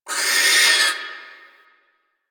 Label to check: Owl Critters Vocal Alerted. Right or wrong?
Right